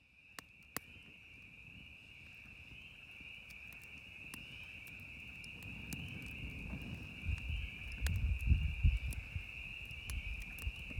Frogs crickets and campfire

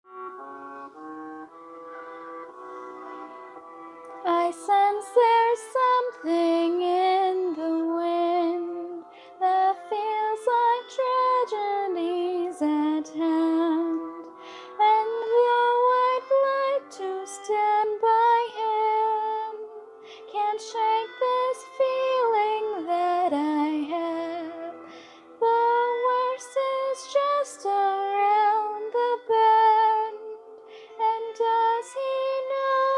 [F] [Amateur Singing]

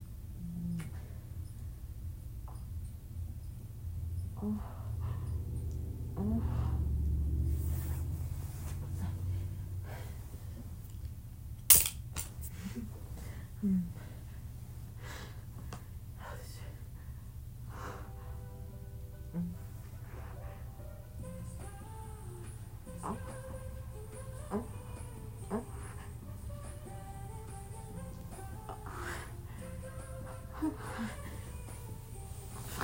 Wet Noises, Whimpering; F4M; light; Slappin; Risky Ruttin

